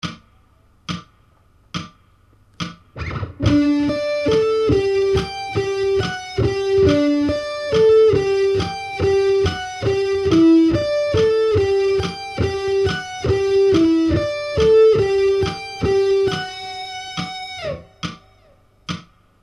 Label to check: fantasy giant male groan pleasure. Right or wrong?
Wrong